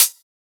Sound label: Closed Hat; FLPRO